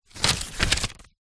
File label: household book very small page turn; zapsplat